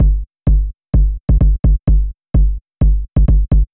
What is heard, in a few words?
Kick Low End